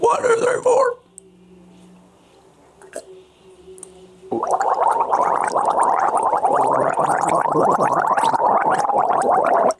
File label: GRINDCORE VOCALIST